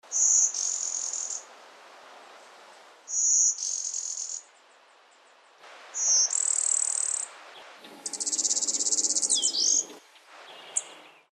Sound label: WARBLER BLUE WINGED, birds; species/birds; wbba